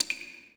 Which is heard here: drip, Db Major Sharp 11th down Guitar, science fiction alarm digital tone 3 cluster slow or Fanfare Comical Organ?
drip